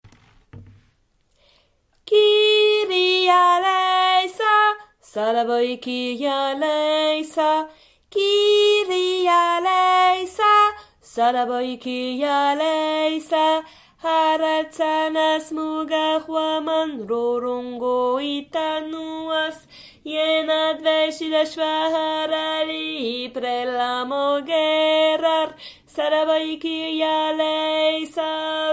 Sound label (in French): Kirialesa basse